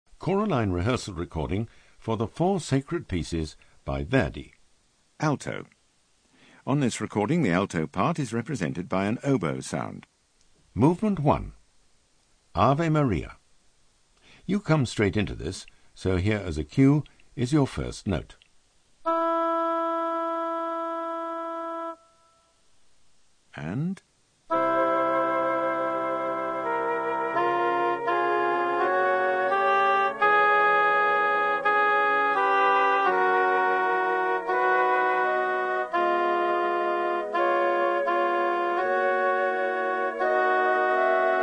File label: Alto; Verdi 4 Sacred Pcs